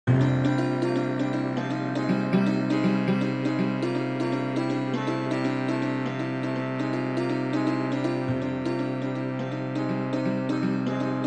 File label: square steps to 36/13